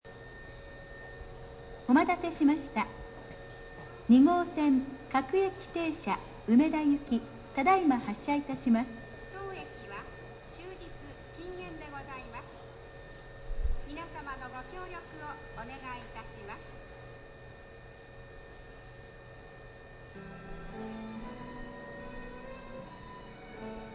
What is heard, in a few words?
bell, station; 2号線発車ベル; 阪急梅田駅